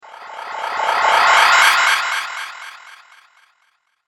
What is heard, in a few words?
Magic Fantastic Whoosh; fantasy sound effect; Whoosh Sound Effects